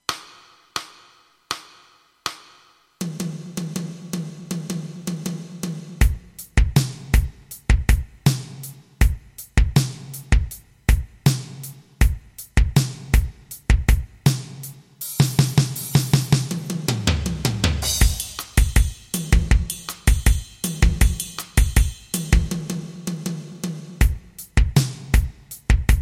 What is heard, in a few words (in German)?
mix etuede, schlagzeug; schlagzeug stuecke etueden